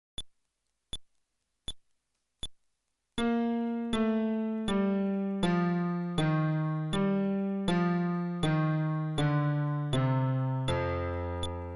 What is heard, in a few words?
Scale テンポ80, fretless bass